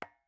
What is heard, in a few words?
new character